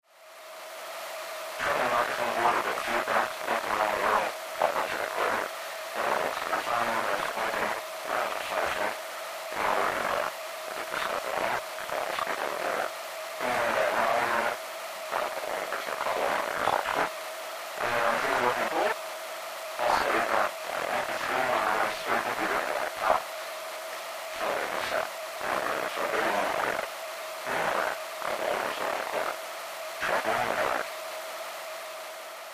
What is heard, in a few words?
Communications Static, static voices